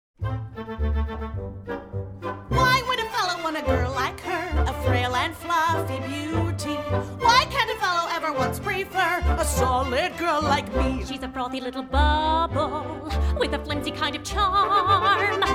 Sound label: Vocals